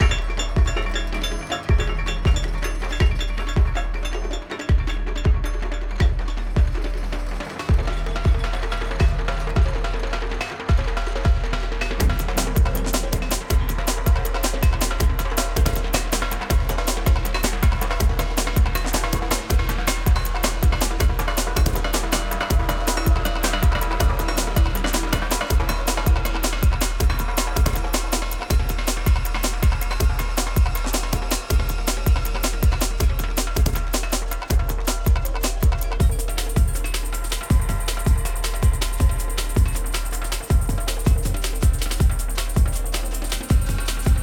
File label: Music Fight; Sifu; H4 Level Area=Cave Intel